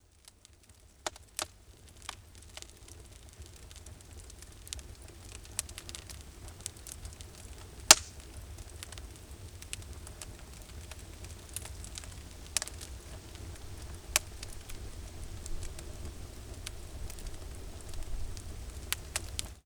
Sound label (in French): feu attractions